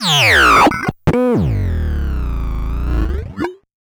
Bent Talking Teacher, Circuit Bent

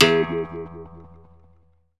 WA-WA, Berimbau

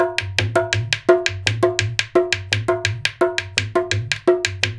100 DHOL, Indian Traditions; USB Soundscan vol.45; AKAI

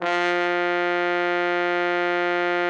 TROMBONE; Best Service

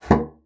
bassoon slap; Xsample VOL-6; C BASSOON

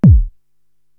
BD, Drum Machines; Korg TR-Rack; TeknoKing

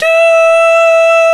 Male Scats; 90s Sample CDs; Club-50 - Foundations Roland

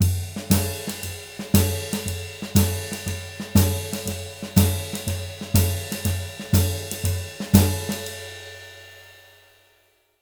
120 SHUFFL; Univers Sons; Jazzistic

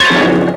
noise 2, sound noise; NOISE+ALARM

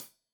Hat Tight, Hi-Hat, Cymbals

